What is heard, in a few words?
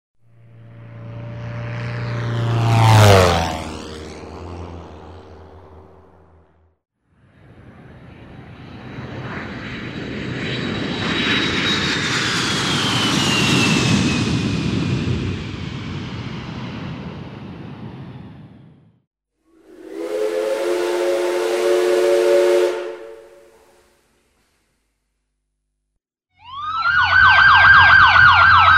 propeller, jet plane, train, police car; efectos especiales